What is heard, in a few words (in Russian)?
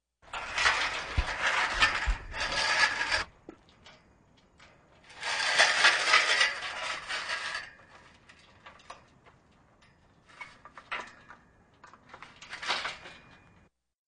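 дверь на полозках с сеткой; Dver na polozkah s setkoy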